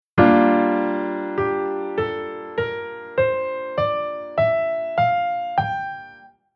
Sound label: Chord Chord Scale Sec Dominant